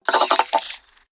Crab Death

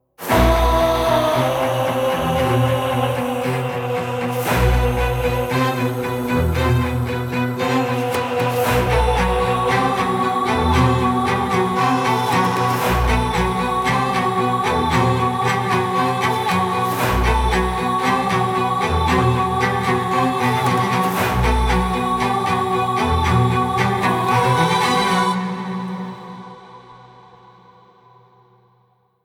Boss Fight

